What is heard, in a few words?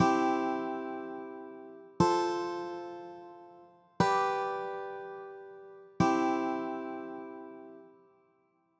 major cadence